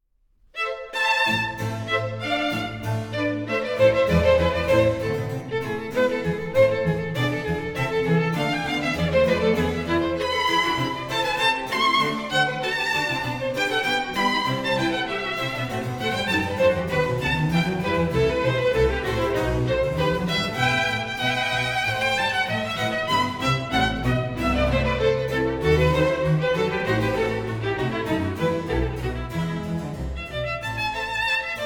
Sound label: Violin Concerto No. 1 in A minor